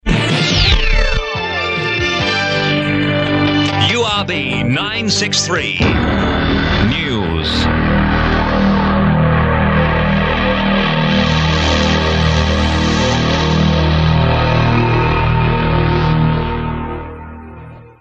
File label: in the news today bed, jingle; urb 93 news